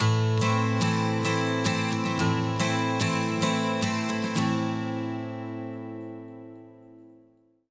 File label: 110 MIN 12 STR, Acoustic Guitar Loops; USB Soundscan vol.04 - Electric & Acoustic Guitar Loops; AKAI